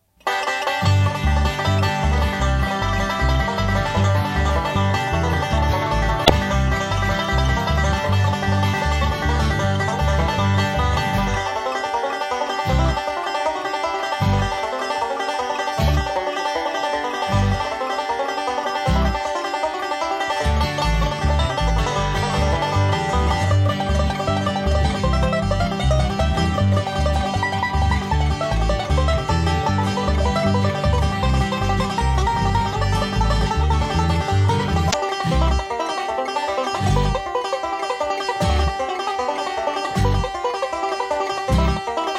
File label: Dixie Breakdown fast, banjo